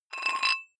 dumbbell thread take off weight soft, fitnessstudio